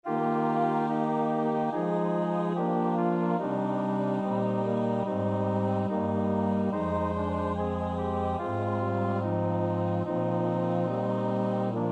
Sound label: midi